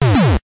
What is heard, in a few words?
Bad Hit Sound; Beat Saber Miss Sounds; SMB3Firework